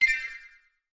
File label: Shield button press; CrossyRoad